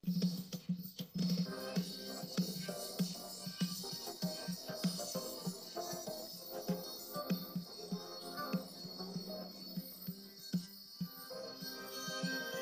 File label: no vocals; htdemucs 6s